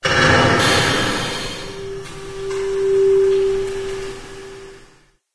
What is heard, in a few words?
metal groan